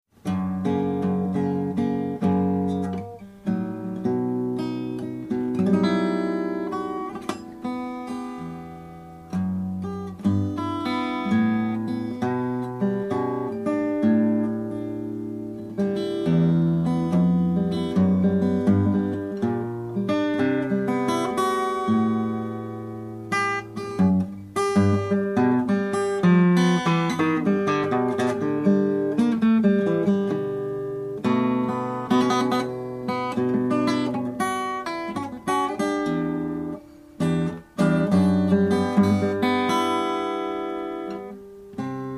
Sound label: steel no. 1